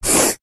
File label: office pencil drawing line on paper hard